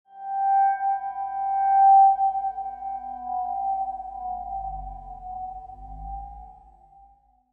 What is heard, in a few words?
Tone Ring Mysterio